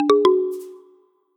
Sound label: notification; humand mobile; android